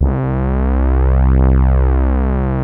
JX stab C2, hard brass; Music Store - Claudius Diemeter Schwerlos

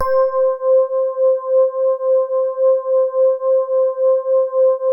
SWEETER C4, E-PIANO; Music Store - Claudius Diemeter Schwerlos

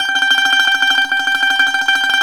BGL Trem G 3, Mediteranean Traditions

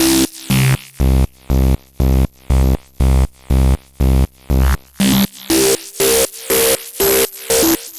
Synth, Prototechno; Sonic Foundry; Synths

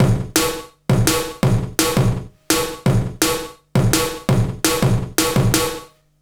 166 break, Jungle; USB Soundscan vol.05; AKAI; 90s sample CD

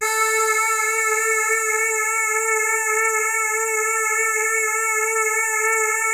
PPG CULT A3; Music Store - Claudius Diemeter Schwerlos; DIG MYSTICS

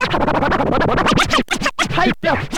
scratch short, scratches; scratches mix; Masterbits; Vinyl Attack

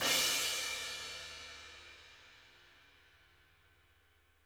CRASH

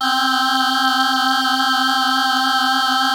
PPG VOX B C5; DIG VOX; Music Store - Claudius Diemeter Schwerlos

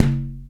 Tube Low G Slap; Multisamples; Digital Sound Factory Planet Earth

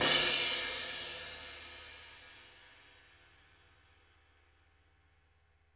crash

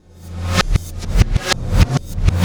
Beat, processed drumkits; Sonic Foundry (Sony Creative Software); Processed Drumkits Zero Gravity Beats; Black Hole

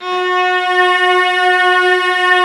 Vla Lg F#3; Multisamples; Digital Sound Factory Virtuoso

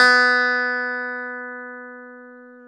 Clav; Keyboards of The 60's and 70's

